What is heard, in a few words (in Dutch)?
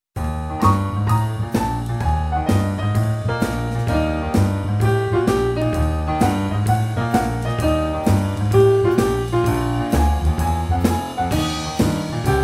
Het achtergronddeuntje van Ik kan zingen; Muziekreis